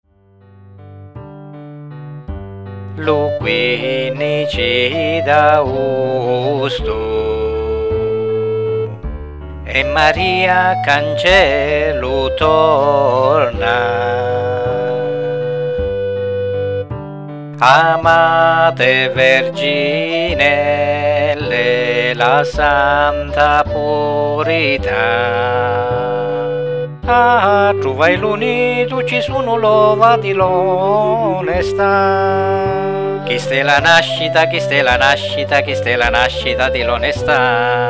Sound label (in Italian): vocestrum, canzoni religiose; madonna di meaagosto